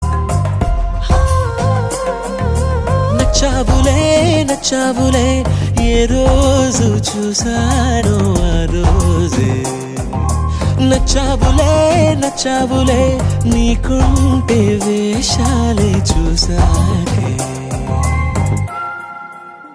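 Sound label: Theme background music Ringtone; Virupaksha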